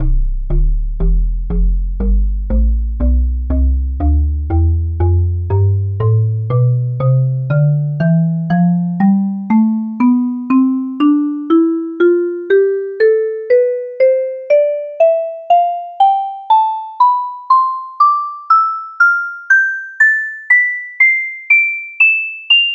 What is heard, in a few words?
marimba tonleiter